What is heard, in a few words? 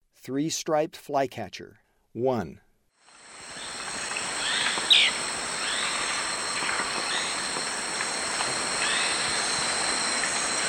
Three-striped Flycatcher; S. America; Eastern Lowlands